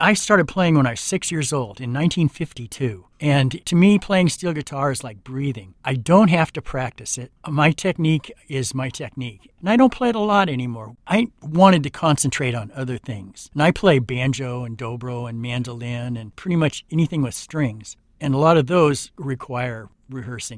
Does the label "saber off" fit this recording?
No